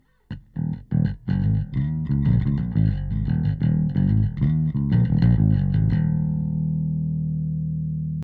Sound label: bass; preset E Bass